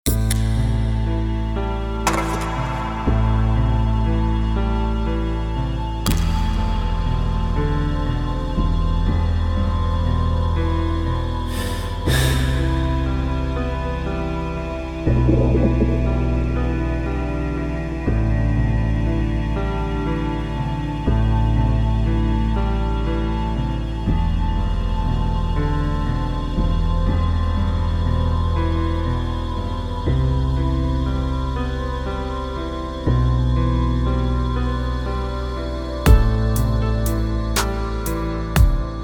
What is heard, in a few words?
chill beat